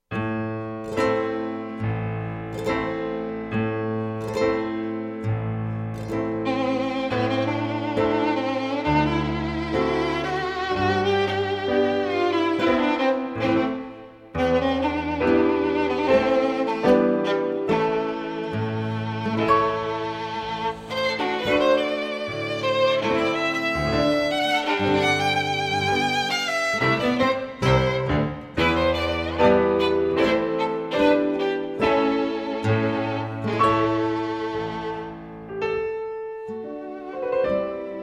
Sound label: Romanian Folk Dances